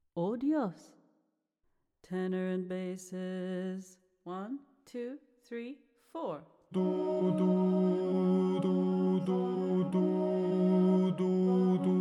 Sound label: tenors basses, choral music